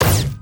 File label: Bounce Ball; gamerip